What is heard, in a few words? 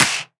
clap